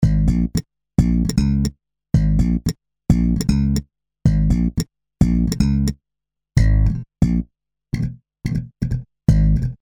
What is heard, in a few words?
Brite Slap